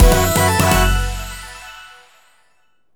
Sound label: TRACK START BATTLE; maimai